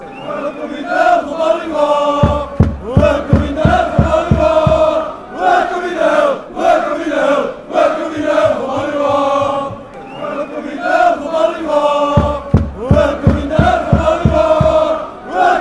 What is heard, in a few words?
mechelen chant